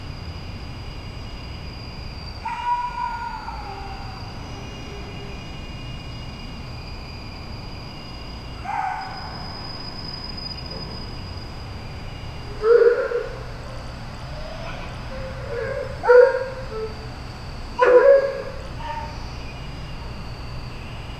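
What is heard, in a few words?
a bad neighborhood at night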